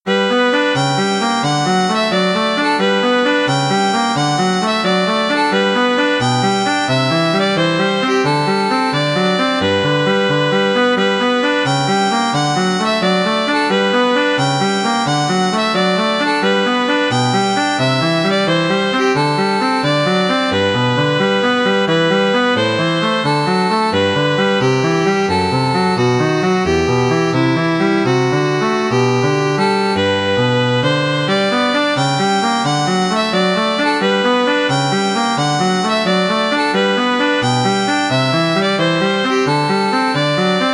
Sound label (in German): violine cello; kinderszenen von fremden laendern und menschen